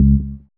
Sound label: dance bass; Sound & Vision - Gigapack II CD 1